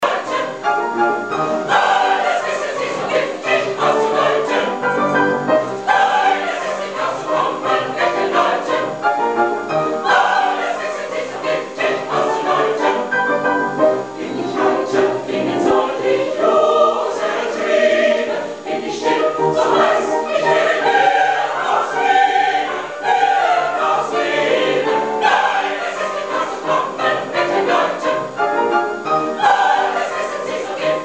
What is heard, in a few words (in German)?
kammerchor; lohr